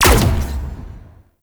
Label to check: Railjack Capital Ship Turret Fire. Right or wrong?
Right